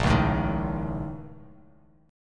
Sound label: Bb Minor Major 11th Bb, Bass Standard; BbmM11; strummed; 6,4,7,8; JGuitar